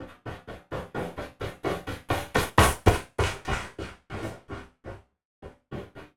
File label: Running Steps